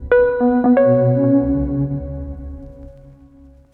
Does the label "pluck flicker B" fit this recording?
Yes